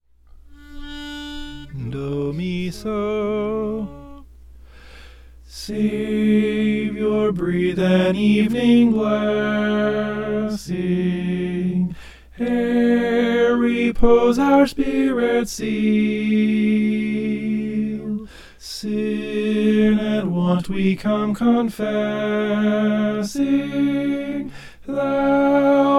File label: savior breathe an evening soprano